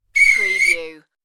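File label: Referee Whistle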